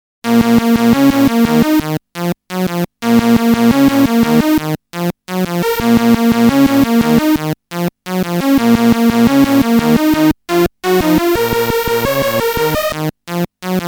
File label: Beep orgy; Factor6